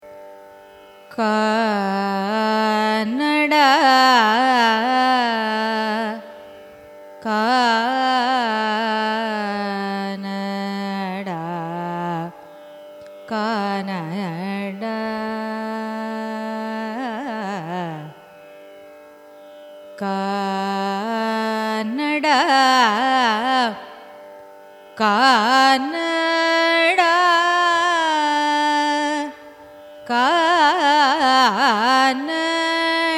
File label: raga kanada signature, carnatic music